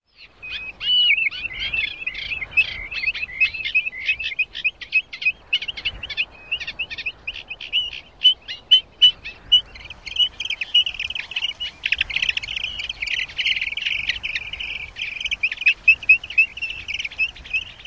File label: foraging calls